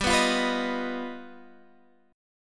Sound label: G Augmented 7th, Guitar, Standard; G+7 strummed; x,10,9,10,12,11